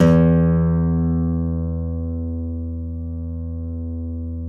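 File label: nylon, FLAMENCO, Guitars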